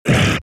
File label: Monster Grunt